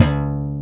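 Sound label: bass guitar C3, jazz bass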